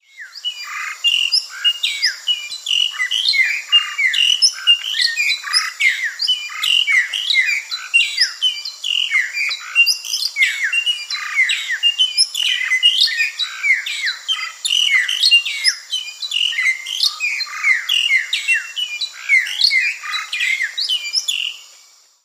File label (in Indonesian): perling ekor panjang song